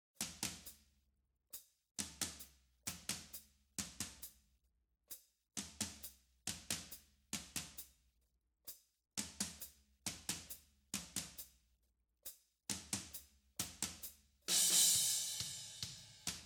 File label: drums